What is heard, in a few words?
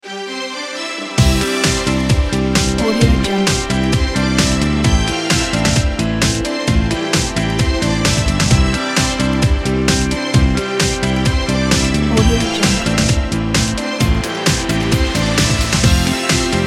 optimistic